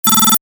Computer Beep, Short; Multimedia; Stuart Duffield

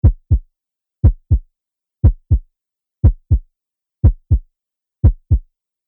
Heart Beats Loop; Heartbeat Sound Effects; human

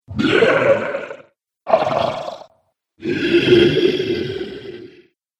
Trolls Selection, Monster; horror

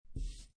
Playing Cards Poker Dealer Button Slide On Felt

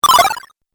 8 Bit Style Extra Life; Video Game Sound Effects

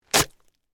Shovel Stab Mud, Impact; Yard Tools

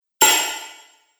Mangled Metal Impact; Miscellaneous Impact; Andrew Fleming

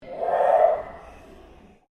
Ambient Pulse Ghost Chatter

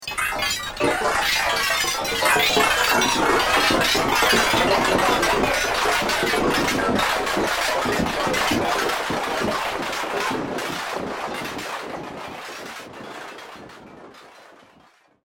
Chaos Robotic Future, robot sound effect; science fiction sound effect; Andrew Fleming

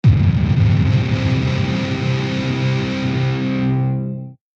Cinematic Hit Electric Power

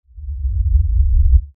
LFE Reverse, Lfe Reverse Element Swell Low Bass; Swells; Blastwave; Sound Effects:Sound Design

